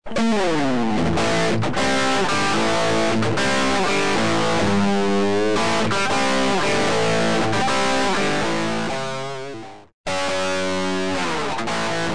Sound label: dist plus